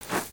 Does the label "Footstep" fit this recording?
Yes